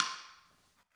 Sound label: xylo